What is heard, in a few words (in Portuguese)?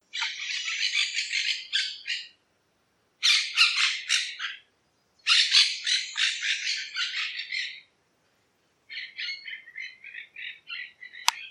Canto da Caturrita